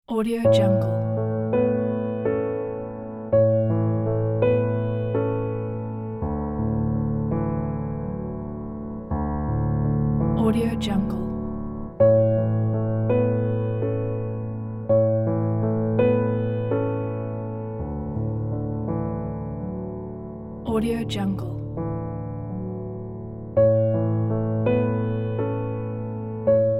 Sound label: piano emotive